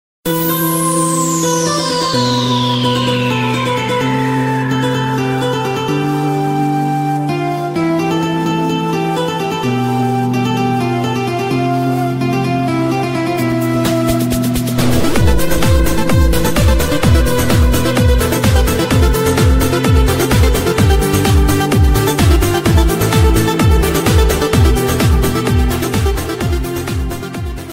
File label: piano